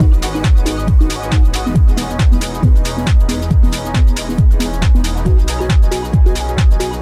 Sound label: Dance